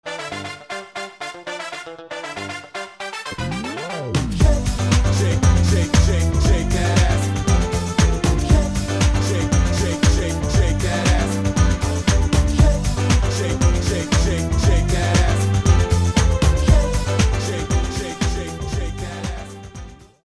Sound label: shake